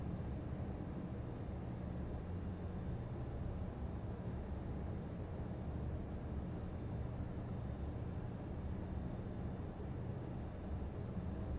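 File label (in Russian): бесперывный отдалённый звук работы производства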